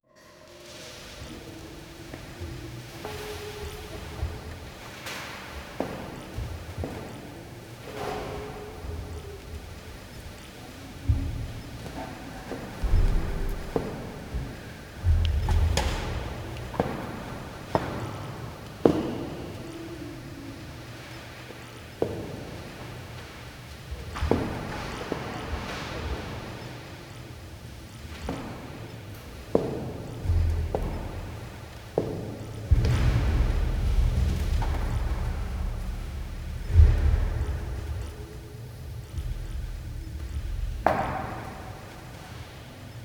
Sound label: create a dark ambient sou